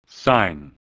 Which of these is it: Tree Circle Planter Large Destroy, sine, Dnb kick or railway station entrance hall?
sine